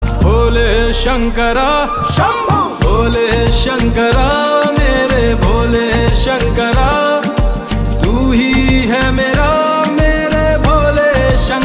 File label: Ringtone